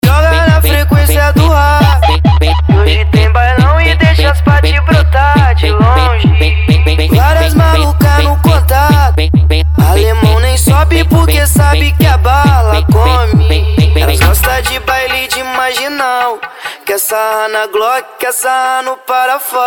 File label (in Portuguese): especial funk mega bass